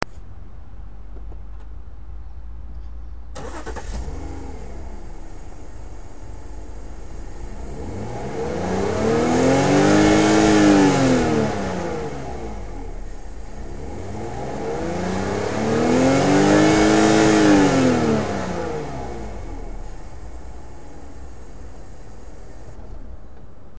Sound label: motor out, bmw5